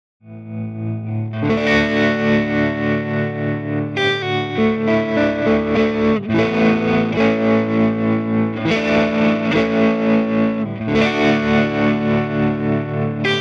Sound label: Mic OD Tremolo